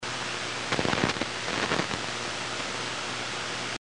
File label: sferics, 60 miles